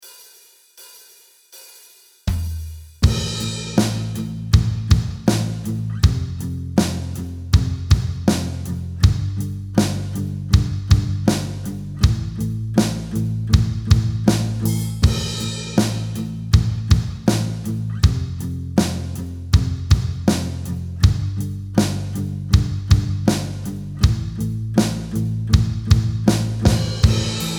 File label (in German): Akustik Gitarre Backing Track; akustik gitarre schnellstart; start riff